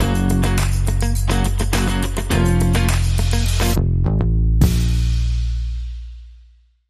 Bumper

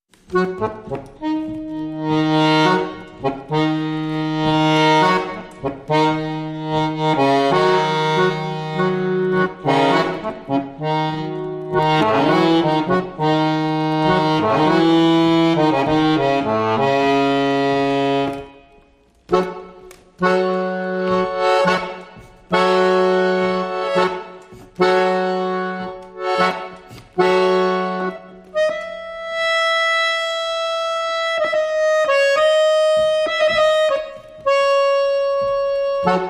Accordion Solo

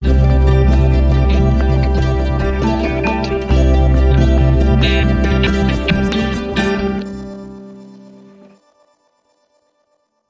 First Triad Sting

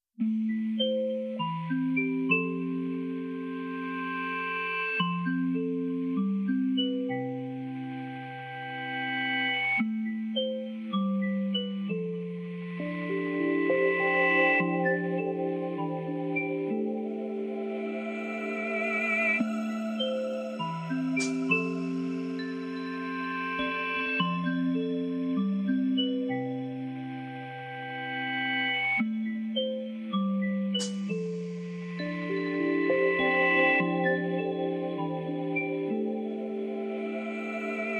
Marimba Only Mix

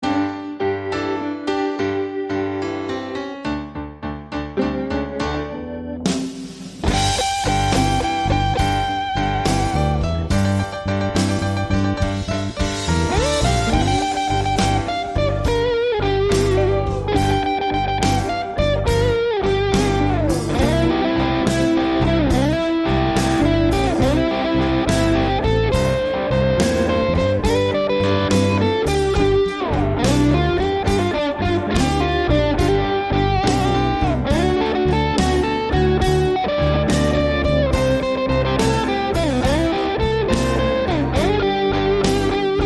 Blues

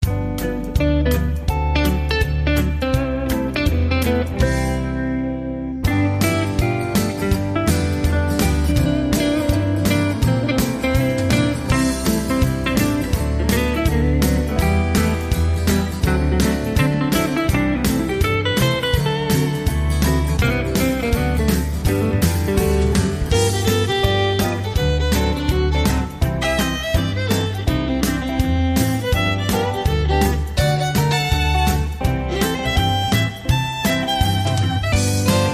Country Swing

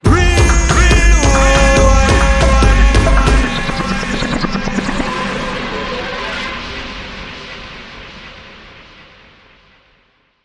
Dub, Sting

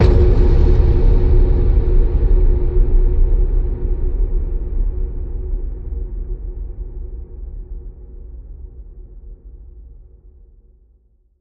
Space Station Boom

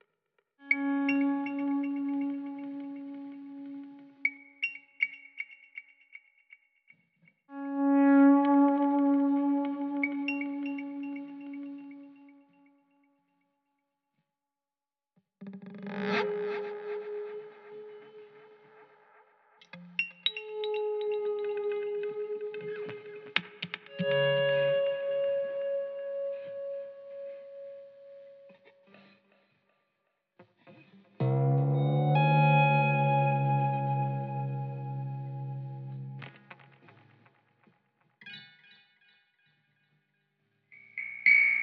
Guitar FX

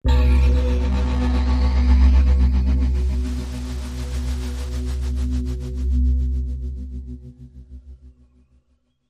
FX Sub Drone

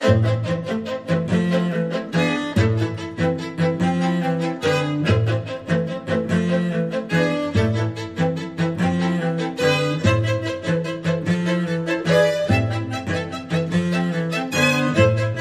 Spiccato

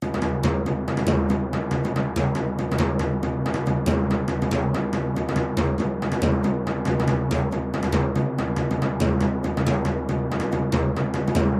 Loop Layer Frame

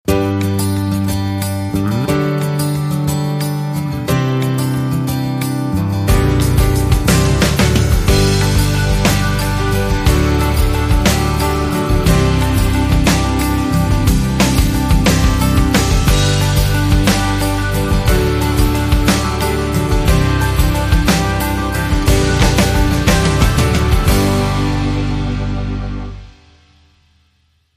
Guitar, Rhythm

